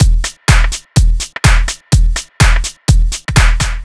Dark Dirty No Loop, Drum Loops 125bpm, Nu Dance Electro